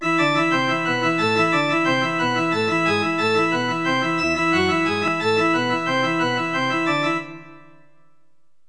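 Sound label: organ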